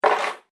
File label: Coins Dropped Wooden Surface; Common coins; Money; Objects